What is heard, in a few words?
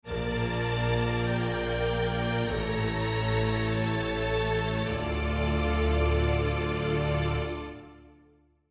Str Samples Sketch